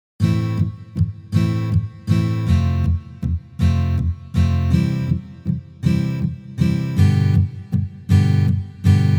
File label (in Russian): Valsovyj boj na gitare